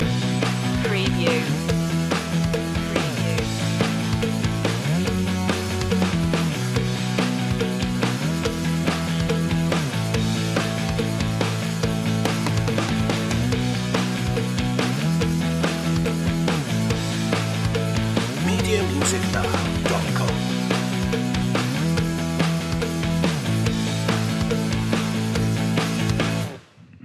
celebrate loop

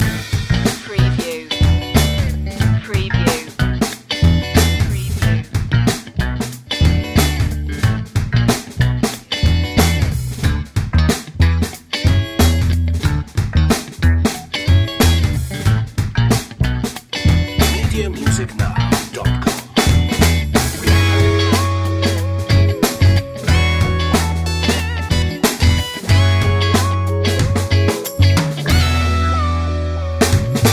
funky loop

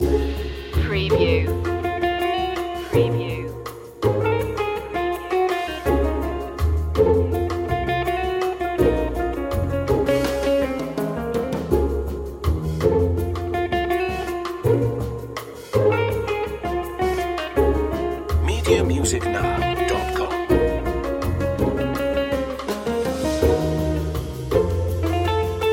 entropy humor loop

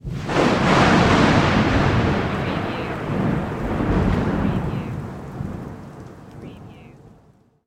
Lightning Bolt Thunder; Sound Effects Bible

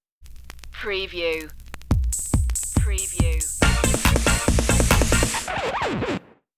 short vintage dance cue stinger

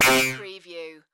Digital Alert Distorted Tone

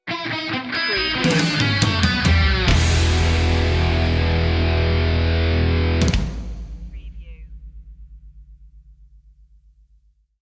thunderbolt sting

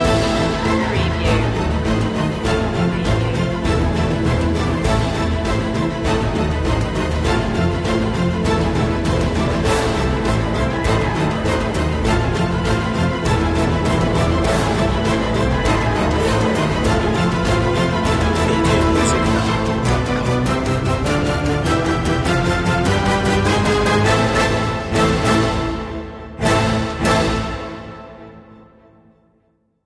no choir

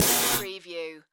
Mechanical Static Notification

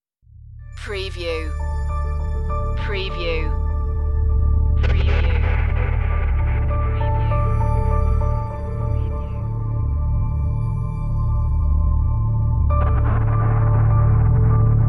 underworld series dramatic cue

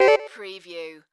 Multimedia Chime

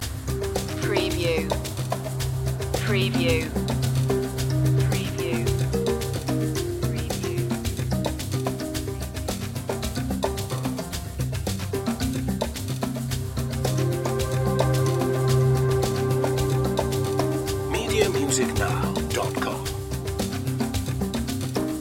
african fields loop

